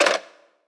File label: Hang Up